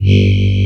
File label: VO G1, ANA VOX, SYNTHESIZER; AKAI S6000 CD-ROM - Volume 1